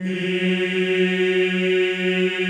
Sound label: CHO F#2, CHO Men; Sound & Vision - Gigapack I CD 2 (Roland); Men-Combi